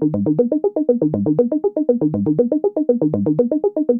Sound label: SH101 Arp (120BPM), retro house